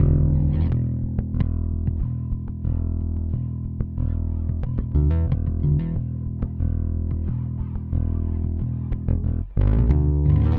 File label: Delay, Bass; Effected; Bass X